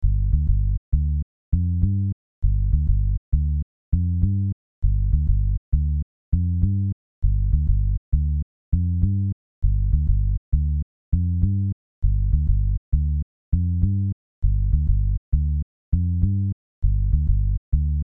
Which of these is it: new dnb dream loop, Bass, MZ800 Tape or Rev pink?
Bass